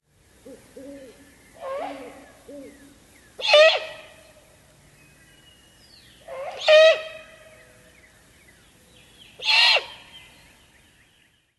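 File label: immature calls, bird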